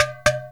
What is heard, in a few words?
INDIAN DR, INDIAN SET; Spectrasonics; Heart of Asia